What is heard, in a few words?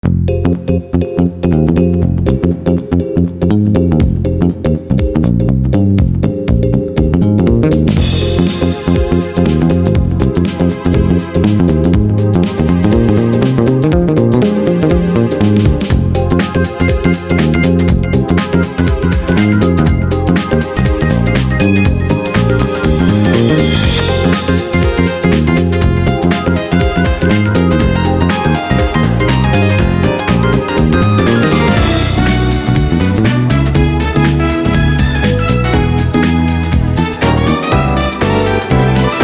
shreddage bass morse code